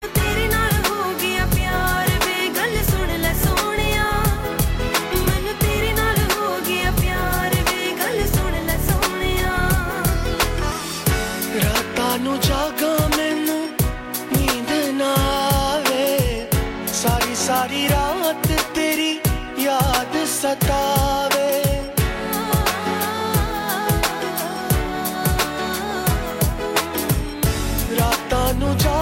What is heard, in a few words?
Female